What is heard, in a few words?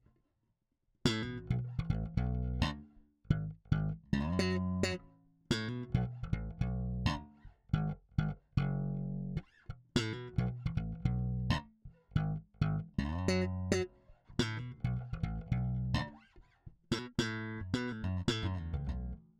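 slap PJ both pickups solo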